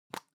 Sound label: object plastic can lid screw open soft